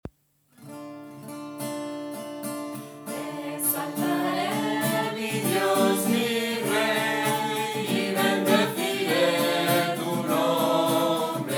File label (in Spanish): piano